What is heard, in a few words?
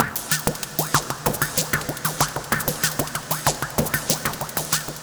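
space spore beat up 1 octave, hyperspace pulse grooves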